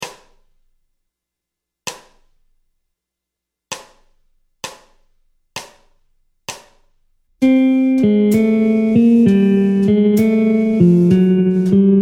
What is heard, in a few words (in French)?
Gamme bop desc Pos 52 C Lydien b7, guitare jazz; boper ses gammes position 52